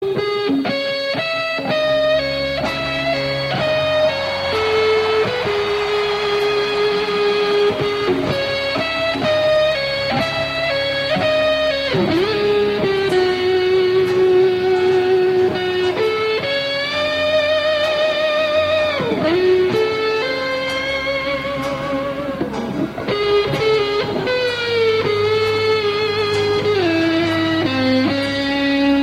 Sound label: Solo